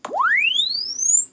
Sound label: Press Two Sides Chirp; Samsung 4 Recordings